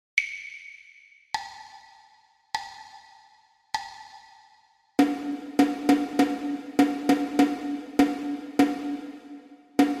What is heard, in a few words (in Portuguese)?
percepcao ditado ritmico